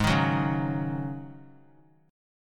G sharp 7th Suspended 4th G sharp; G#7sus4 strummed; JGuitar

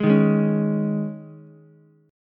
Db Suspended 2nd, Ukulele; strummed; JGuitar

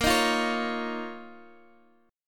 F 7th Suspended 4th Sharp 5th A sharp

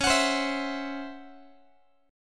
C sharp Minor Major 7th Double Flat 5th, Guitar; C#mM7bb5; strummed; x,21,21,22,20,x; 4A,4E,5A,5D,5G#,6C#

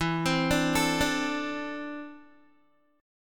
E 7th Suspended 4th Sharp 5th, Guitar; E7sus4#5; arpeggiated; 12,15,12,14,14,x

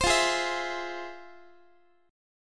F Minor Major 7th, Guitar, chord; FmM7 strummed; JGuitar

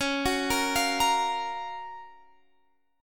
F sharp Major 7th

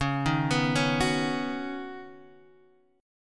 Db 7th Suspended 2nd Suspended 4th

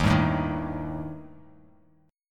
D sharp Major Flat 5th Bass Standard; strummed; D#Mb5; 11,10,7,8; JGuitar

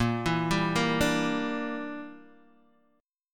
Bb 6th Flat 5th Bb, Guitar DADGAD

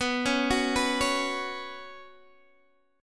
B Suspended 2nd, Guitar, Open D

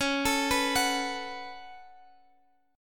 Db 7th Suspended 4th Sharp 5th Db, chord

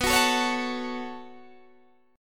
B 7th Suspended 2nd, Guitar, Open D

